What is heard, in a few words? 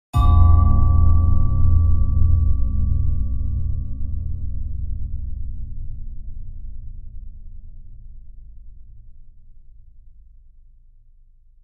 Bell Chime Lfe Reverb